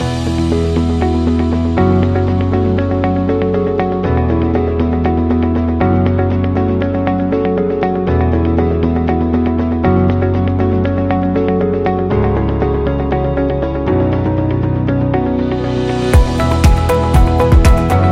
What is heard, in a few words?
Positive Corporate Loop Upbeat